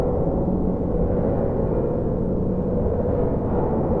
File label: Noise Loop, sparse soundscape